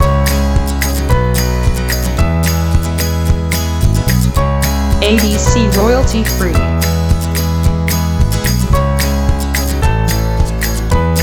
Celtic Folk loop

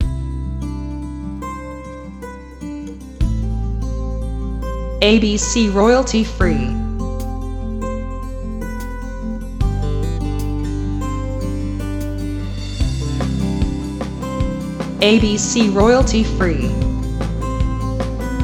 Acoustic Guitar Background

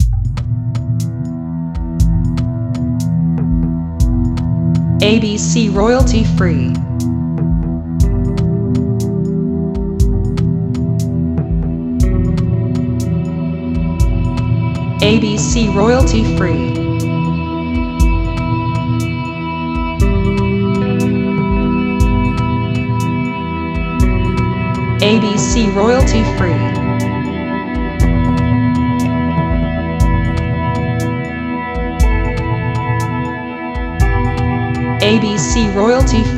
Guitar Soundscape

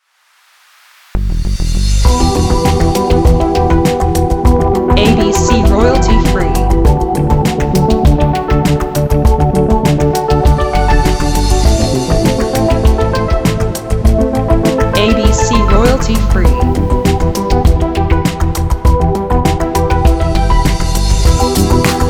Electro Ambient Chillout short

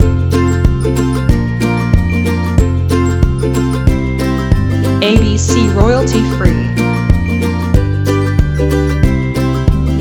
Happy Ukulele Background

